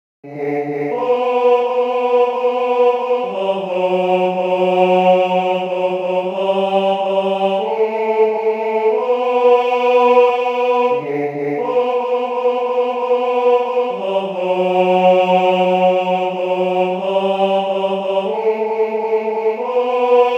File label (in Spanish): tenor; Cantata para SATB, Solistas y Narración